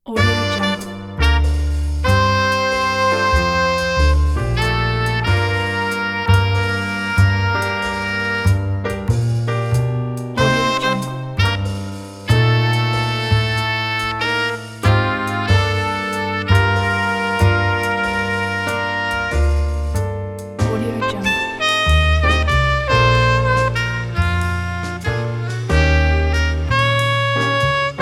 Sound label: crime jazz